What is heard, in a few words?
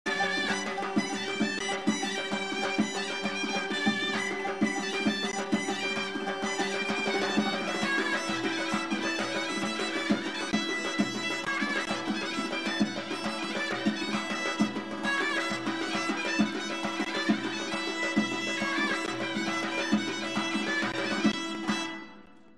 zurna